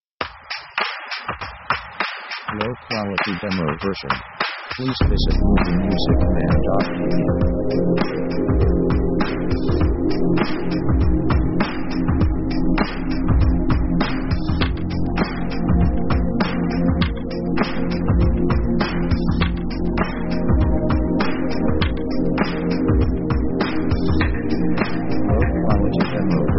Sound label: liquid motion; synth themes